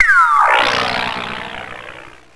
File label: spellcast